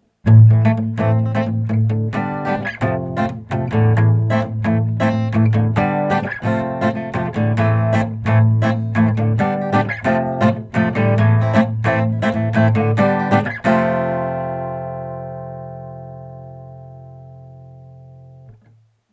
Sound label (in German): beh mic pm